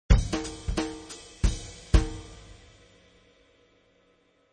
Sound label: Jazz drum ending at 180 bpm.; Drum Loops